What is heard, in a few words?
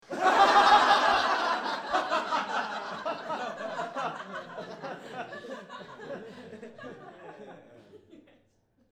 group laugh long, Crowd-Small Group Laugh; Small Crowd , Mixed Group Of Men And Women Laugh